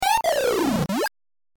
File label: lolo gets hit; Adventures of Lolo 2